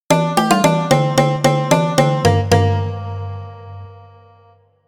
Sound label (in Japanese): 和ジングル